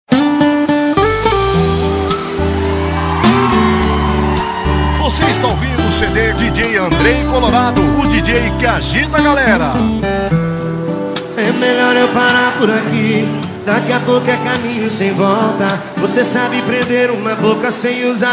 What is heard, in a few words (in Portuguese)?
sertanejo com locutores de rodeio